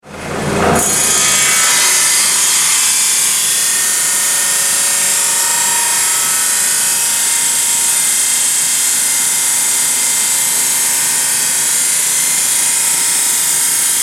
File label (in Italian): taglio roccia